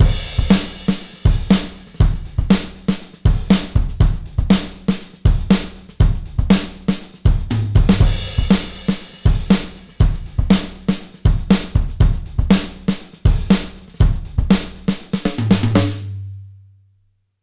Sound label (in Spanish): Procesado tiempos rapidos